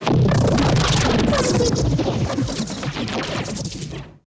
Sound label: Bass Synth Effect; neuro; BIG5AUDIO